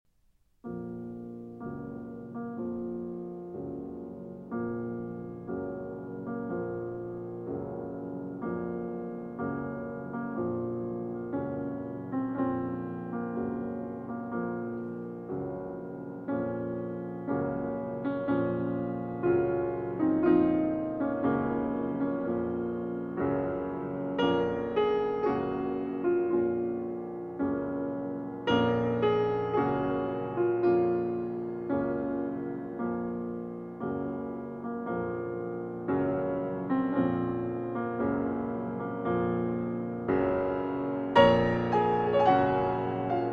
Piano Sonata No. 2 in B-Flat Minor, Marche Funèbre